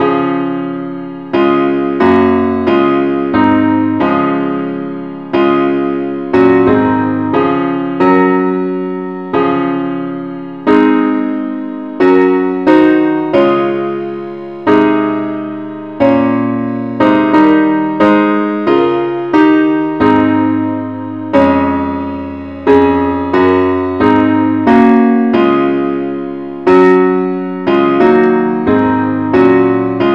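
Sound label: parts only, key C sharp; christe Sanctorum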